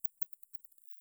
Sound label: Nyctalus noctula, batcalls, europe